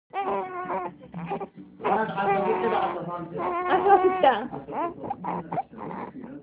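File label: baby hungry, male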